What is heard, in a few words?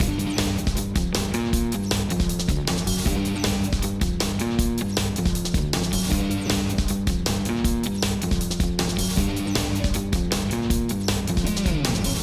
Tornado Combat MIXED; ben 10